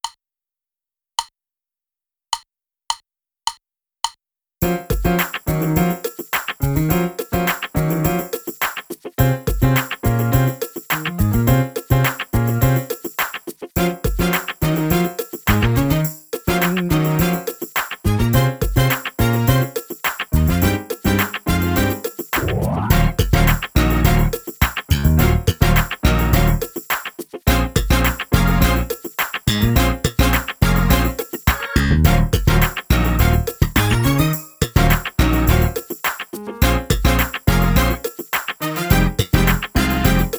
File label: Jam drumless